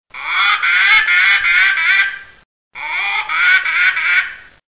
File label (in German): ente